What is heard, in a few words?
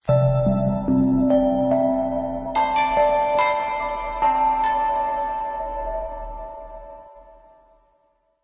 Music Theme